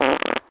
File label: Fart; SFX Kit; Foley - Misc